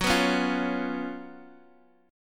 Fsharp Major 7th Suspended 4th Fsharp, Guitar DADGAD; chordshape; down